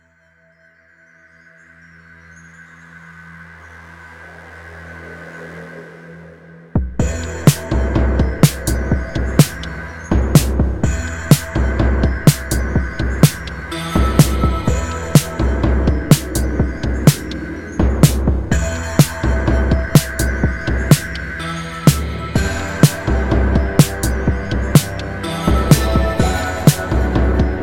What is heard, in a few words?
soring plane soundtrack; vintage collection vgm sfx tracks stuff vol ii